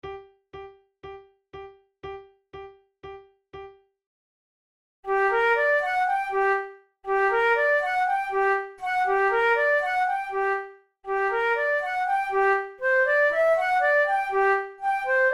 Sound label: vivace, flute tunes